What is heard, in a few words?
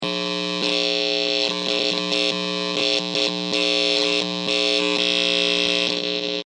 Electric Unstable Short, Short circuit electricity buzz arci; Short circuit electricity buzz arcing.; LYN111 package of Electricity sounds; Electrical Sound Effects